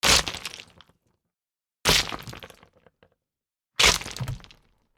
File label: rocks on concrete series